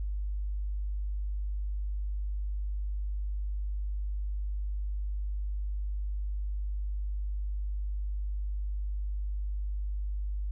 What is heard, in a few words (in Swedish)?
50 Hz sinus -30dBFS